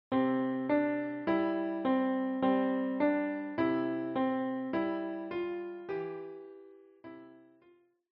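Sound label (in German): Bruder Jakob, Klavier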